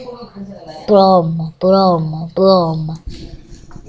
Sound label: drum